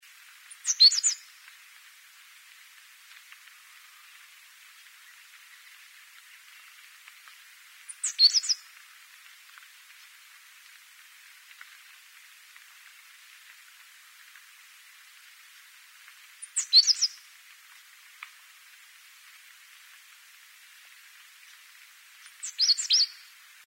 fulvous headed brush finch

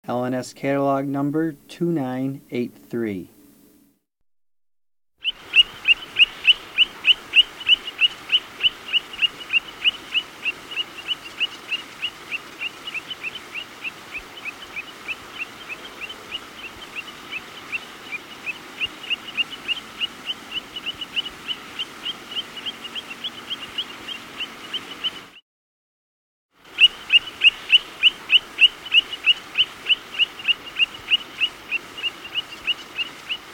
long-billed plover

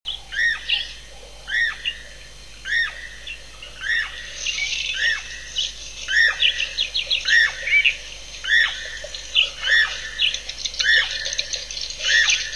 levaillants cuckoo

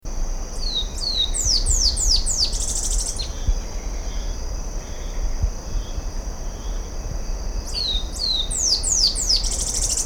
tricoloured brush finch

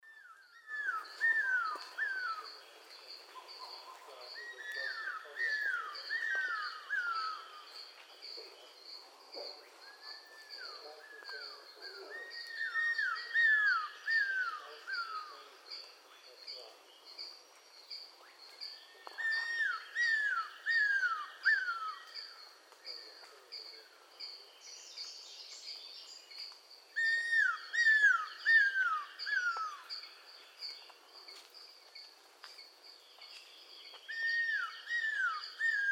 cuckoo roller

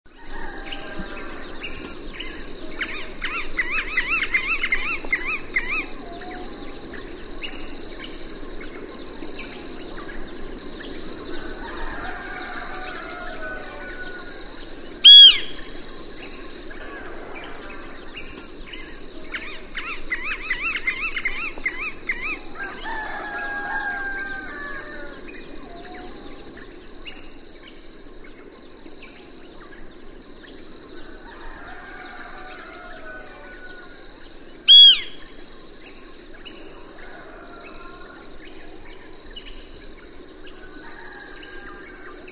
banded woodpecker

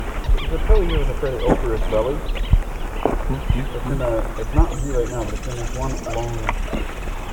grey breasted woodpecker

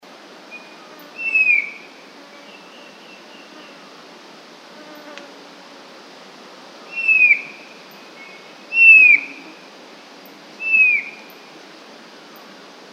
yellow eyed cuckooshrike

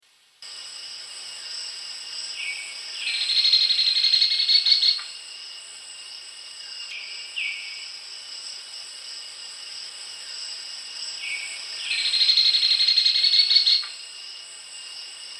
black and buff woodpecker

